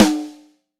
boom snare sample d key, acoustic snare sample tuned to the D note.; snare drum samples